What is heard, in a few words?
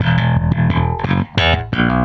Electric Bass; Basses